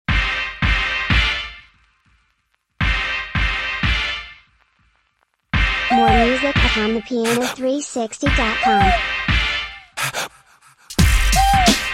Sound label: kwaito